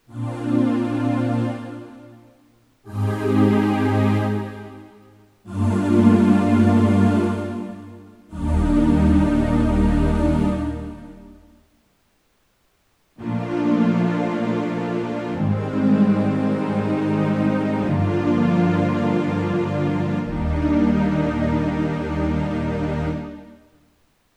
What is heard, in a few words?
Thomann DP-28 Plus Synth Pads